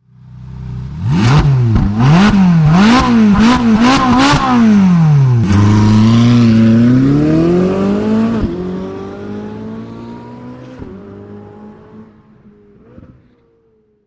458 Speciale standing start External sound, Ferrari